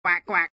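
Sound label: duck medium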